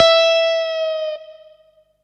Synth Shot; Vengeance Samples

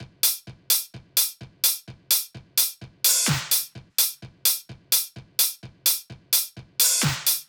128BPM Latin Kit; Latin E Kit

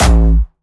A1, Jumpstyle Kick; Bassdrums; Jumpstyle Multis; vengeance essential club sounds-3; VEC3 Bassdrums

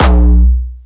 B1, Jumpstyle Kick Solo; Vengeance Samples; Bassdrums; Jumpstyle Multis

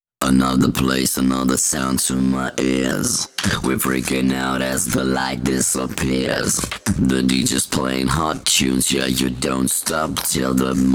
Pitched Vocals Vers WET, 140 BPM; Vengeance Samples; Song Kits

